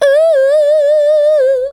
vocals sung; Techno Trance Essentials

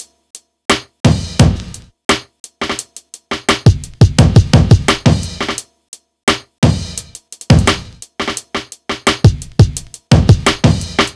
Drums, Cinematic Funk; Sonic Foundry (Sony Creative Software); Cinematic Funk Urban Tone Poems

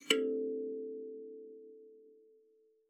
KALIMBA A4; Xsample VOL-7

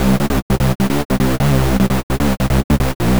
Mo Hoov 150 C, future rave samples, 150bpm

